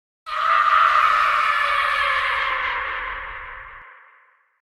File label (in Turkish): Ciglik bildirim sesi korkunc ses